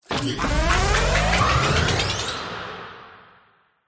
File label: robotical power up sequence